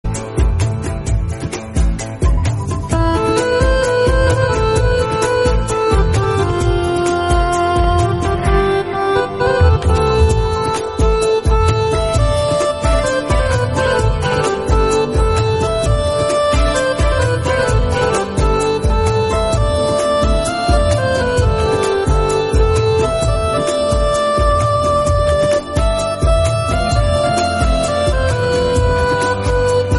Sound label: instrumental